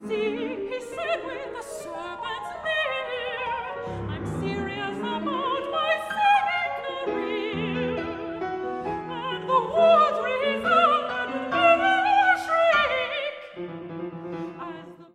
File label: Accompaniment serpent